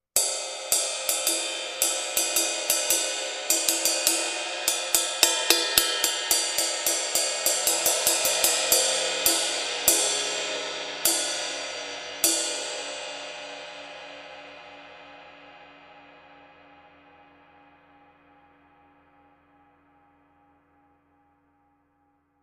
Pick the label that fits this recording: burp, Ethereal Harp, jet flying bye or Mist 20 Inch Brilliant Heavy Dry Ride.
Mist 20 Inch Brilliant Heavy Dry Ride